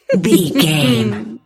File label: voice female laugh